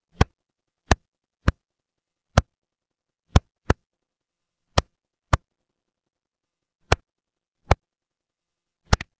ambience fireplace

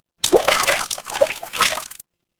water splash

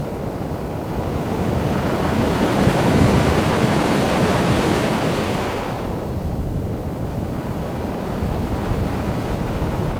ambience ocean

